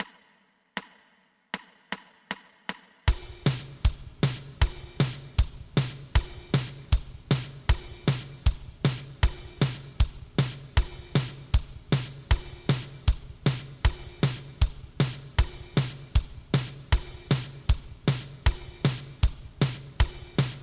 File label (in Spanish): 2 pulsos